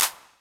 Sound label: TR kit, techno kit; Pro-Rec - Synthsonic